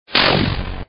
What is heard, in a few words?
bomb; neimeng